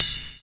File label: BUZZ SNAP; Univers Sons - Kitch 70s